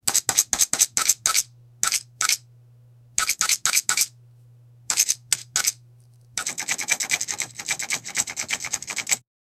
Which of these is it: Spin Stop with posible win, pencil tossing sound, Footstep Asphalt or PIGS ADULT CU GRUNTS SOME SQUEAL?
pencil tossing sound